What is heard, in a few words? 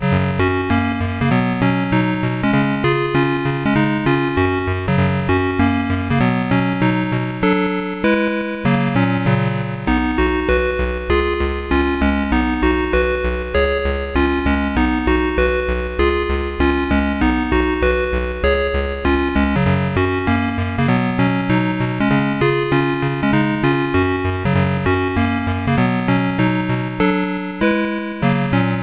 tune